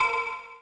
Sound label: limit; Qwitter; TWBlue